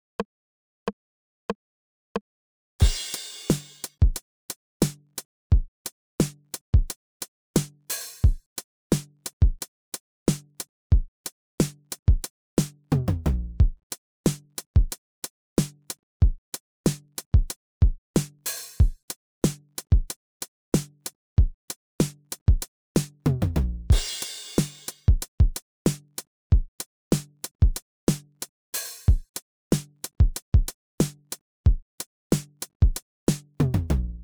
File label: drum